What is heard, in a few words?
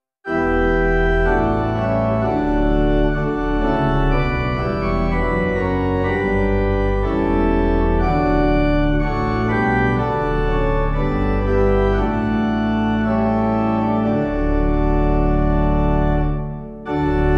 Pipe; God Of Eternity; hymnary